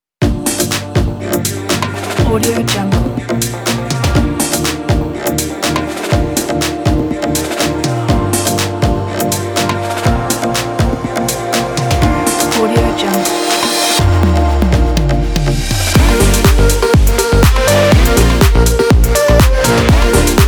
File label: celebration